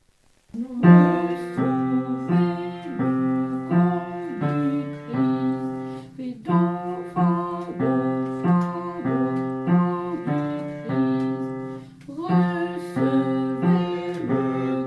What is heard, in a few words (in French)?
basse; Recevez Corps du Christ